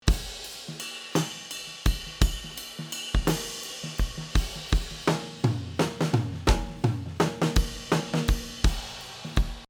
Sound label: Control Full Kit